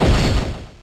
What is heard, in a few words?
Bullet Punch